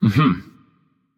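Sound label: nonverbal agree; smite; voicepack; merlin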